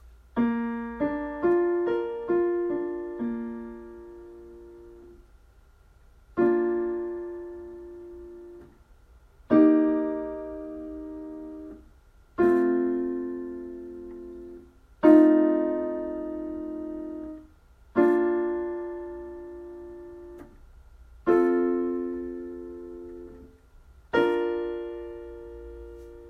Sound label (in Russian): Аккорды